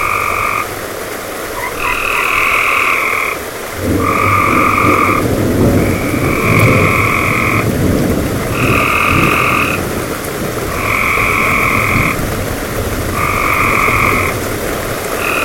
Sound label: storm frogs